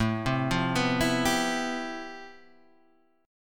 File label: F Major Sharp 11th A